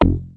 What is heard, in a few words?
stacatto bass; Basses